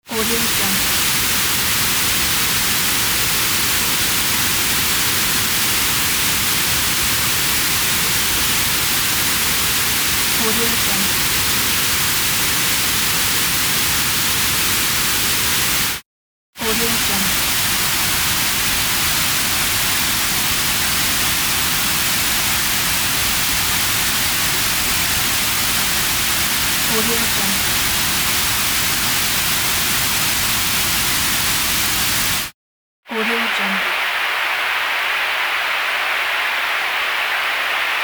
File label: white noise; giovanni-studio